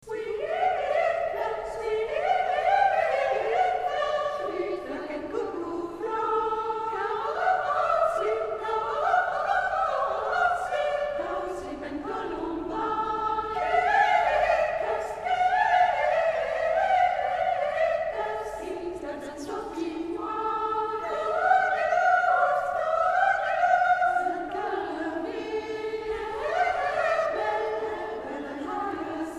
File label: Country girls; Britten